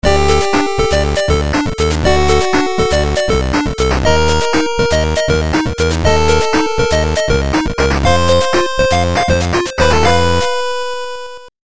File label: Continue; Aeon